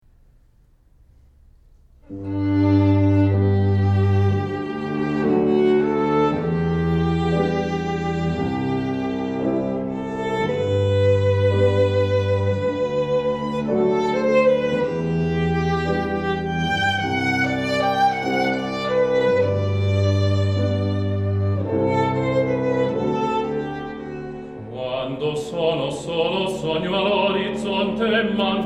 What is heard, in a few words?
Piano Violin Cello Tenor